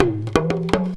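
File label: small tama groove; Best Service; World Colours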